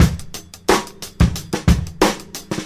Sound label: Drum loops, Boombap